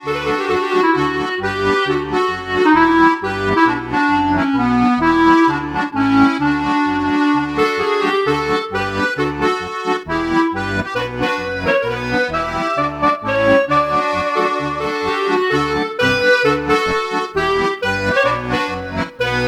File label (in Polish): na kamieniu klarnet